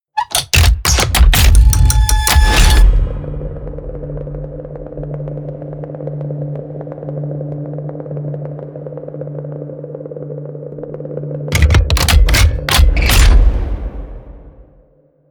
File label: promo pad; music elements